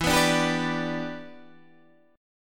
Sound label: E Minor 7th, down, Guitar, DADGAD; Em7; strummed